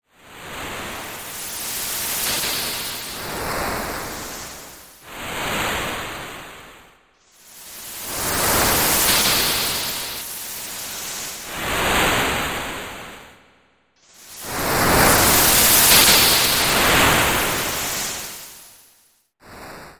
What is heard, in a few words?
ocean sound sfx; final fantasy vi; ff3; ff3us